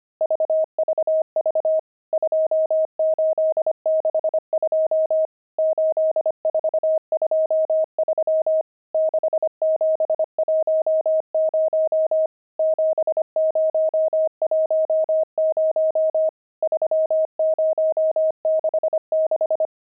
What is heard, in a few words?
pangram, intermediate pangrams files